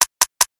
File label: aagun empty; tf2classic